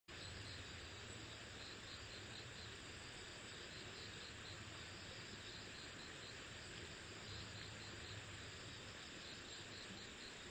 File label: riverside; Kyoto U; Ashiu